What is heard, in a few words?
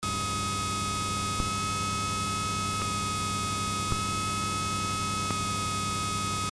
Juno-66 noise original